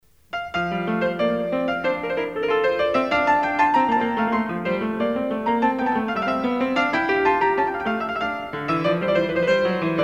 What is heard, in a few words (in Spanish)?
Suite Inglesa IV en Fa Mayor Courante